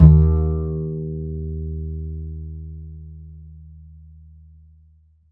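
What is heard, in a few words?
double bass D#2, Jazzistic; Univers Sons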